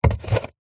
food drink empty plastic milk bottle handle short fast